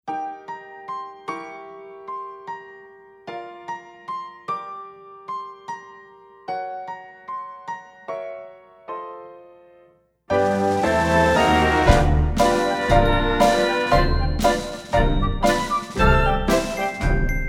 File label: ragtime opening